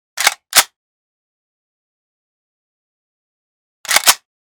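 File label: shotgun reloading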